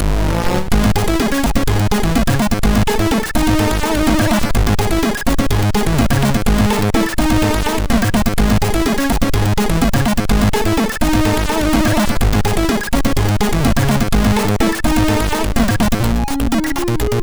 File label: Helicopter Attack, MOS6581R2; Helicopter Attack preview